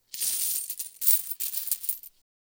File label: coins rustling; soundscrate